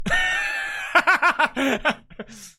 hysterical laugh

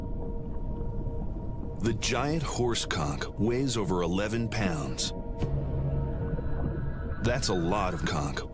giant horse conch

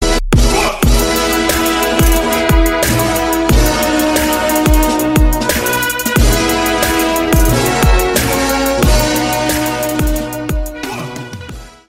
graduation song remix

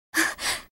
gasp; kasumi

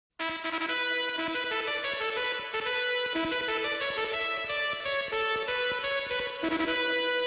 introducing trumpet

